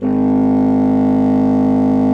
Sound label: G#1, bassoon; Xsample VOL-6